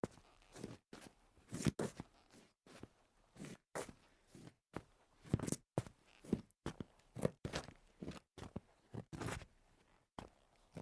sneakers on tile, foley

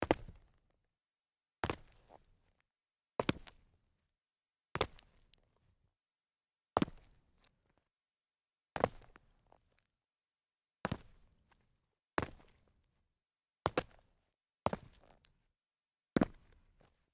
sneaker small jump concrete, foley

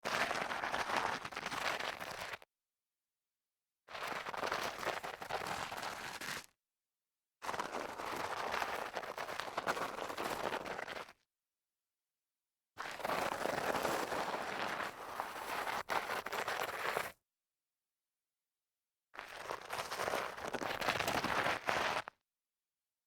sneaker foot slides light grit

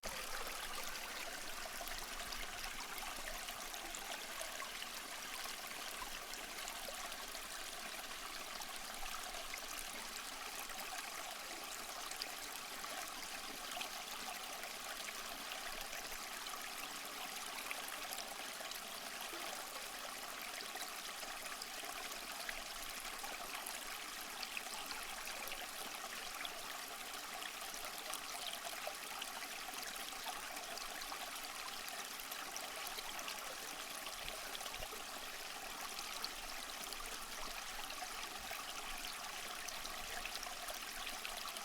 small stream flowing

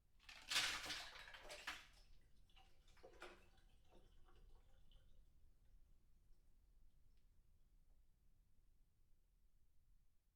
glass of ice pour into sink

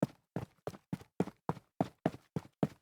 jog on concrete

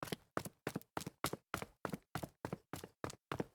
dress shoes on concrete stairs

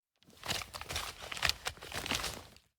golf bag pocket shuffling

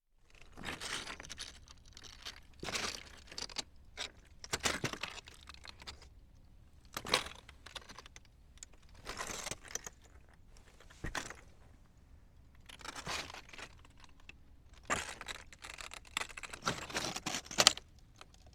walk on rocks, foley

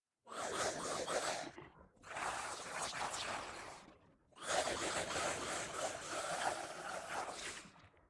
female monster attacking

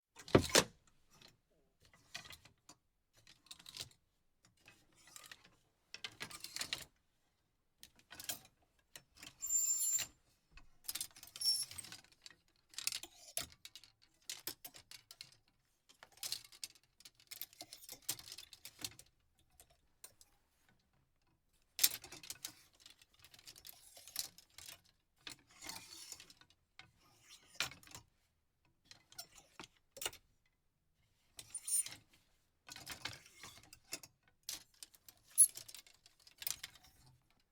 hanger in closet

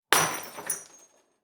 wine glass shatter

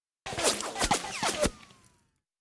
auto bullets flyby short

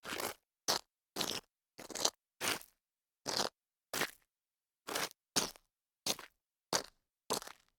scuff gravel with heels

